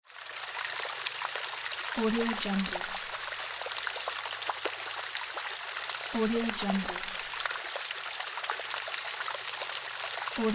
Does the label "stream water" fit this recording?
Yes